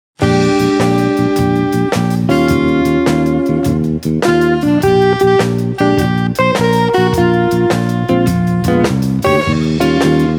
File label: Blues Melancholique